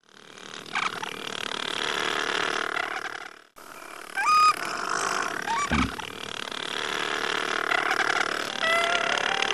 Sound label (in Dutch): dwerg, pinguins